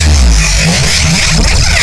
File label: rocket, weapons; ricochet